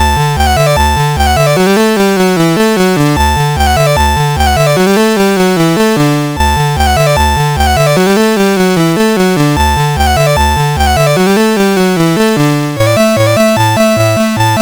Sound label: musette, MOS6581R4